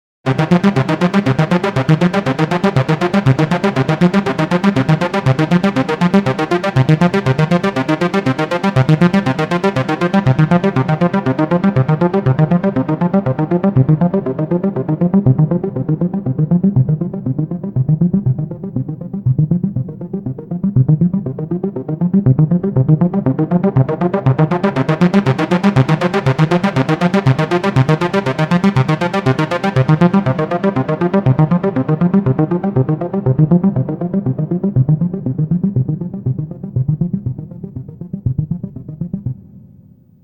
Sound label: Arp